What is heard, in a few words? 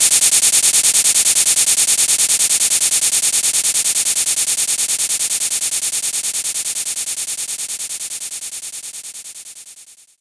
cabasa